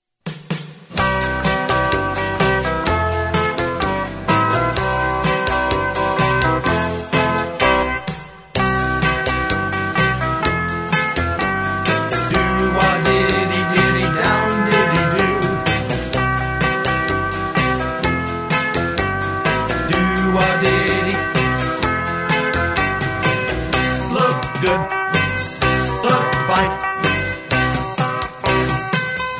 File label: harmony